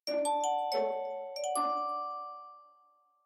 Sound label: Elegant Symphony, phonetones notifications